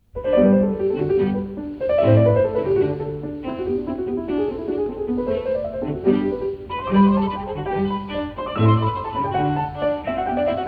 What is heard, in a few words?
Trio for Piano, Violin and Cello in G major; Haydn